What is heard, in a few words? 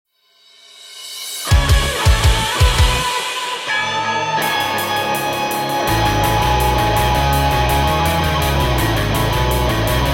English Cover Song; 1st Opening